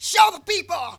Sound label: VOC